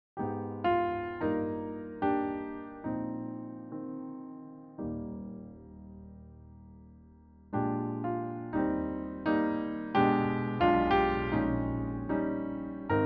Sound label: piano track